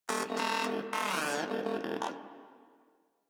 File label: Moa Aggressive Vocal Battle Ends; Warframe